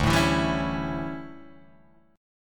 D Major 7th Suspended 2nd Suspended 4th D down Guitar Drop D